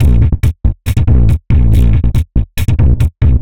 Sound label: perc 140 trashed up, Percussive Loops 140 bpm